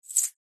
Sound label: industrial chain small grab grasp hand